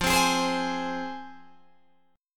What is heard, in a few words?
F Minor, Guitar Open D